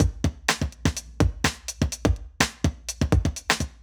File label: Drum loops Electro